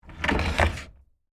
Drawer Open Hotel, Foley, Drawer, Open, Hotel, Dresser; Stuck; Drawer Sound Effects